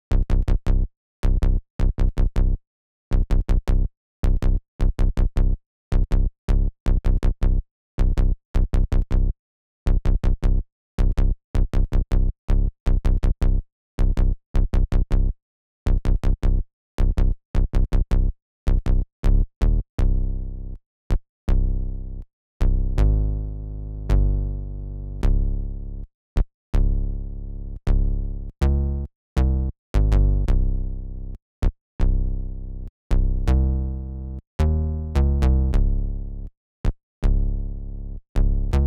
Bass II; risk of rain 2 engineer edition 2

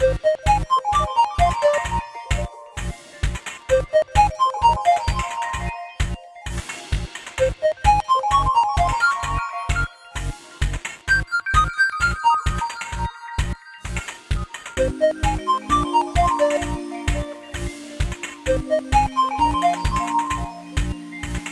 Item Get Normal; SanctuaryRPG

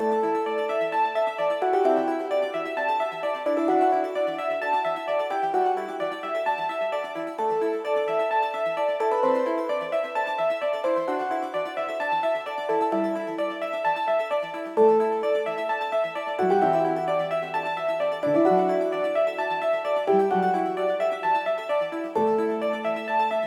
Music Victory 130bpm; Sockventure

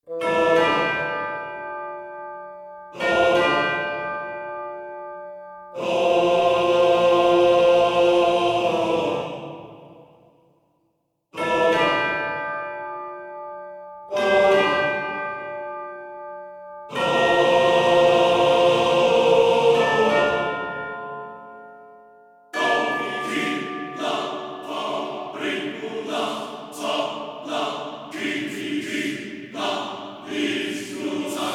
Combat; The Witcher 3 Wild Hunt; Novigrad

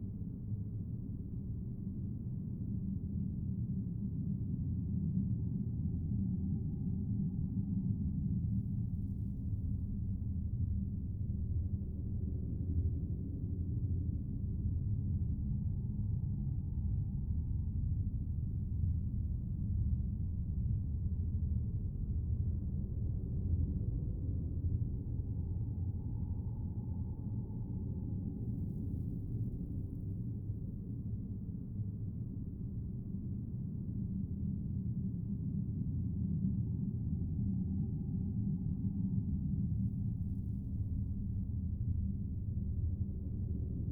Inn Tunnel Ambience Loop; The Inn

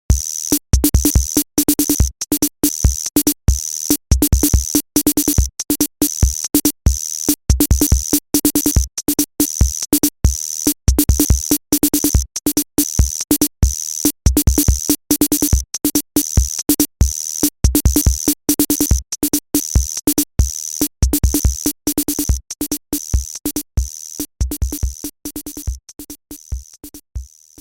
Billiards Battle - Coin Flip

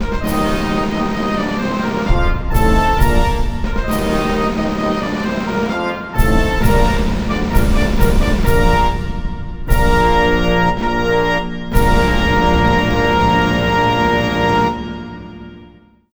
Anthem Argentina; J.League Spectacle Soccer 2002; Dreamcast

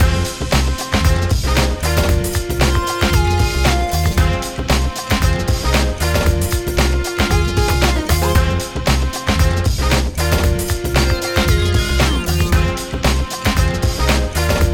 Music Railyard Loop; Subway Surfers Tag

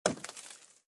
Body hit; iStunt 2

